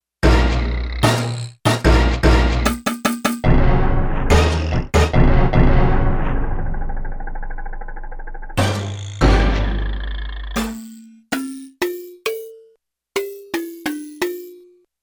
Gated FX

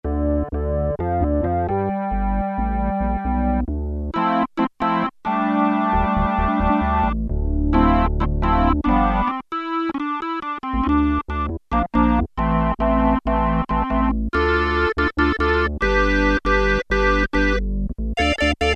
Organ II, Roland JX-3P

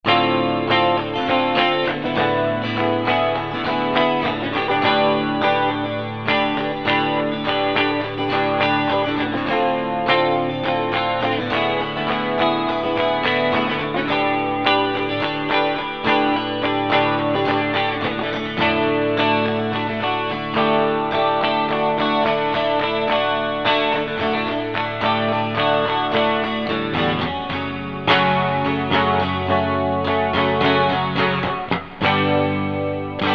BRIGHT CHORUS

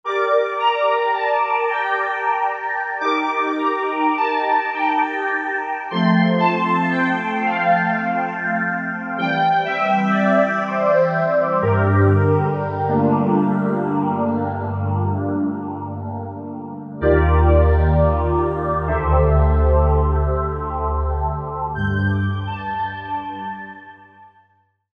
White Arcade

